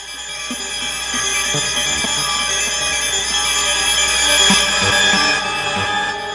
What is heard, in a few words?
Ambient FX, Ambience FX